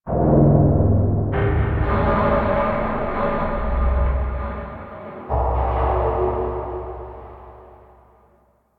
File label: Exterior Transient Wreckage, Ambience; Warframe; Rail Jack